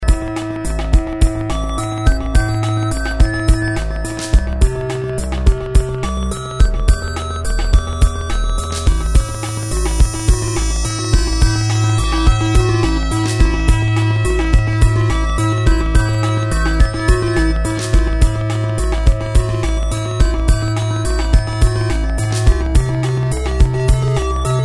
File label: 8Bit